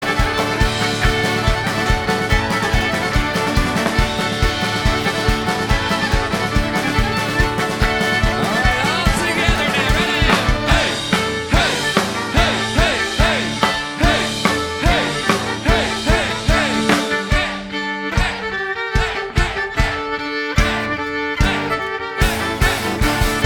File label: Greek Fiddle